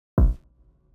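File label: error; win11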